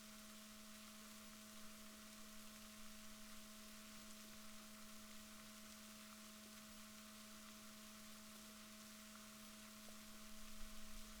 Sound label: rorquals negatif, Balaenoptera Physalus Chile